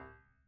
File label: Piano No Sus Rel Close C2, Grand Piano, Steinway B; Chordophones